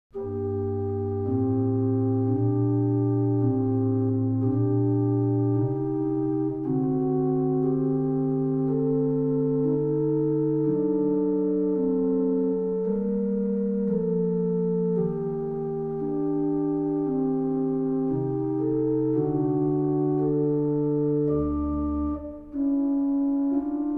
Organ Concerto Op.7 No.6 in B flat major - Organo ad libitum; Handel Masterworks; Klassika